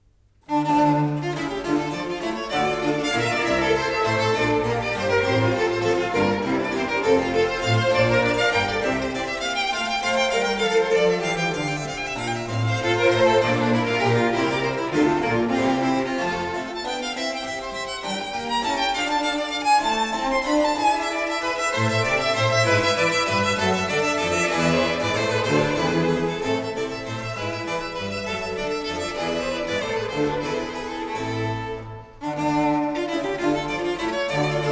Violin Sonata in D minor, op. 5 no. 7- 2. Corrente. Allegro; Corelli